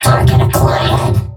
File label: Nemesis Attack Tell